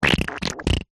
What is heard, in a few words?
human baby poop wet squelch